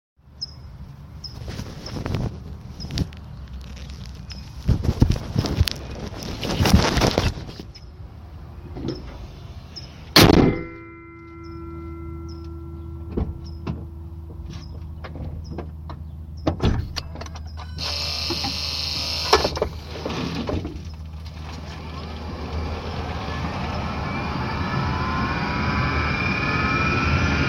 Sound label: whining noise, rav4 ev cars; 14k miles